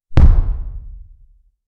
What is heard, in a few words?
short impactful, melee